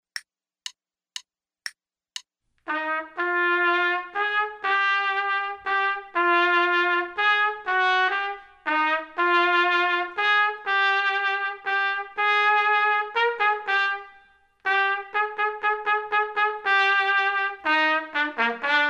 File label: trumpet; Selected Duets for Trumpet Podcast